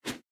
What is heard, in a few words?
tap; balloon jump